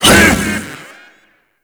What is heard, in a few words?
Ape Prelate Attack Short, Vocals; Warframe; Proto Infested